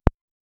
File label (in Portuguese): um passo em uma dungeon, pixel art